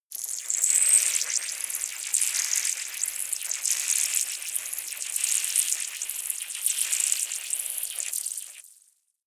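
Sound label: water feature, science fiction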